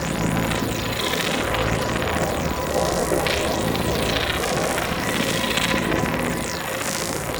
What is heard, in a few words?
Loop; Warframe; Captain Vor; Nervo